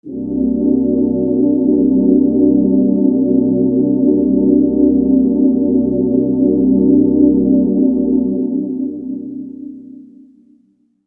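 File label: Synth Pad C minor 9; Sonic Foundry (Sony Creative Software); Techno Synth Loops 1 Signals I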